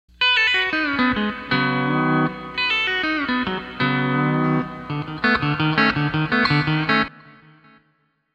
E9th Med Pull Off

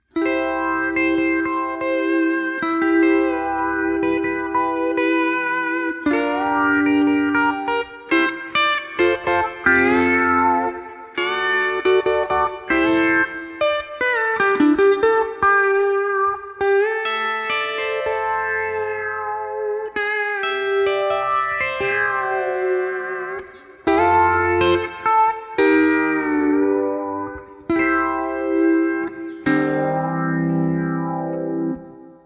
Phaser, ToneX Pedal; Phase Shifter

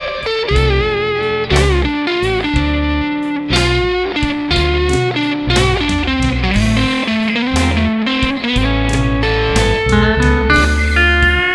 Blues Steel

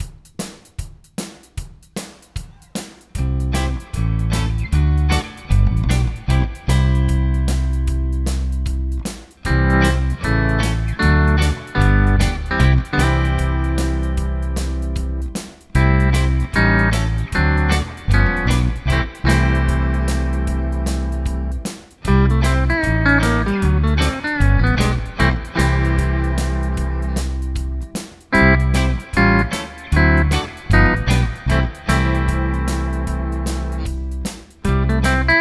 TriTone, E9th; Improv

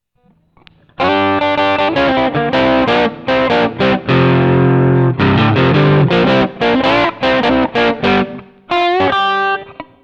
Blues, Boss GT-8; MS1959

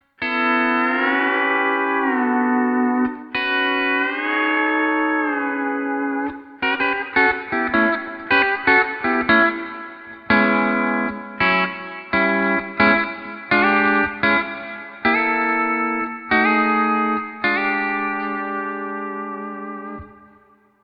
E9th Half A Pedal

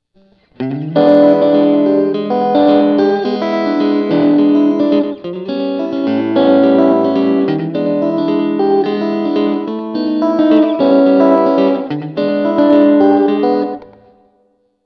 60's tweed, Boss GT-8

